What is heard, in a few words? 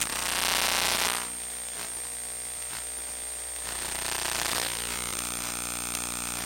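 ambient hum buzz loop, electric; Half Life Model Pack; Half Life Alyx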